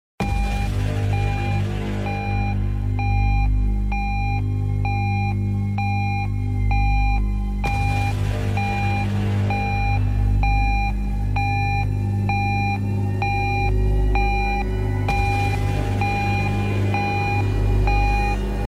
Emergency Effect, sonnerie

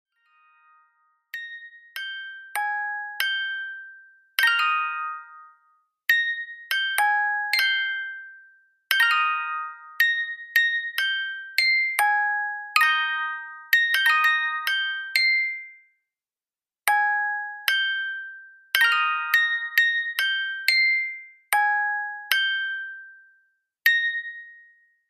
Doll box, sonnerie

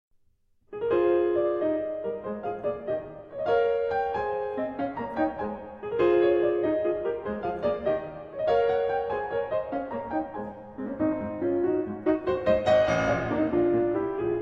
Piano Sonata

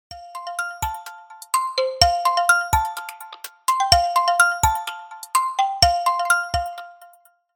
iPhone Clang

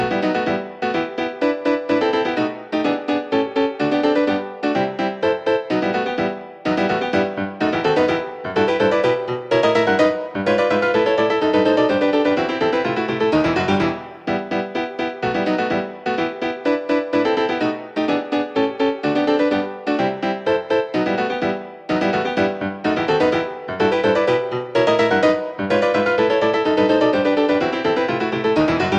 Classical Piano

